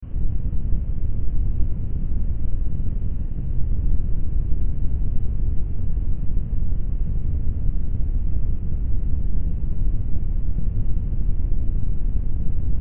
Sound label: Low Brown Noise